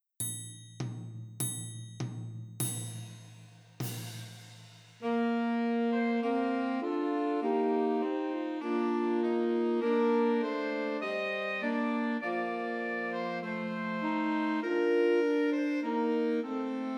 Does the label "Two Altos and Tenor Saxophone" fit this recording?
Yes